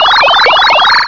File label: Pinball Asterik; Plus! Pinball